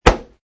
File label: Man wearing jeans and hiking shoes kicks and hits; Man wearing jeans and hiking shoes kicks and hits - variation 6; Punches and Hits; Combat